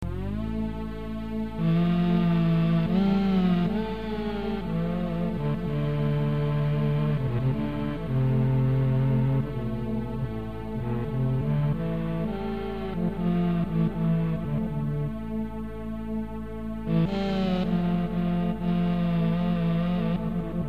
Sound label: Balaban